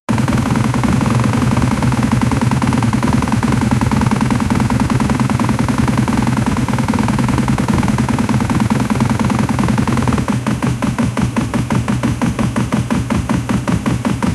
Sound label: video game realistic